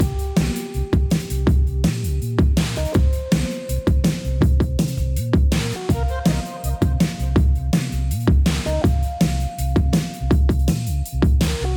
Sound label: DnB Test Song low; hot lava; ios macos windows